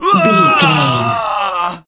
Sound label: voice male death